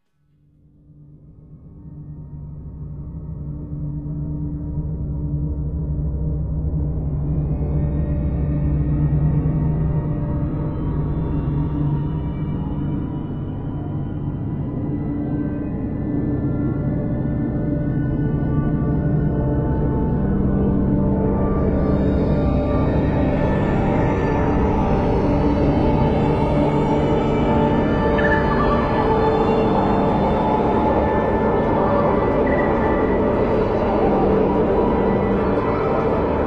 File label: Electronic Interlude; 90s